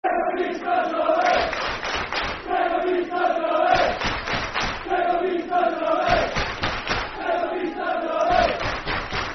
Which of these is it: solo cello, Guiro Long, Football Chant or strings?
Football Chant